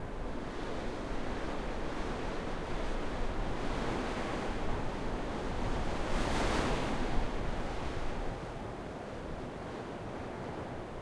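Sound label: ambience simmer springs roof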